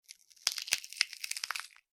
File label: horror bone crush; Bone Being Crushed; Breaking; Bone Crushing; Objects